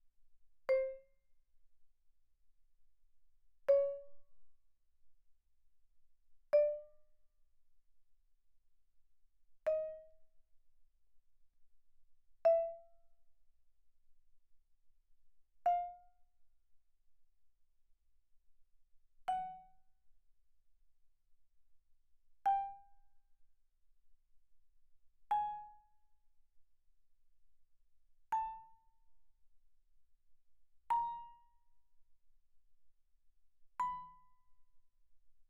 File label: Vibraphone dampen mf C5 B5; Percussion